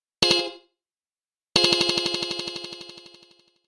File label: Feedback, delay echo